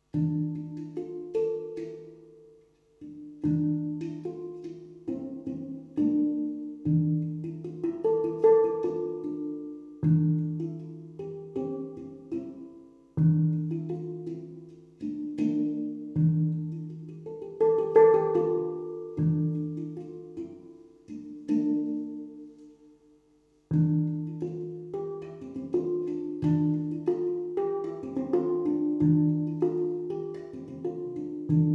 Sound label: melody